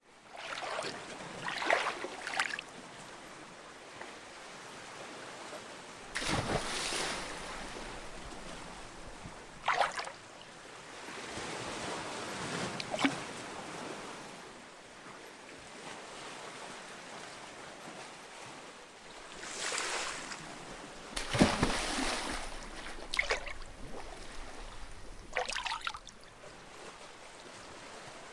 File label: waves